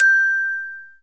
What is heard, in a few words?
Glock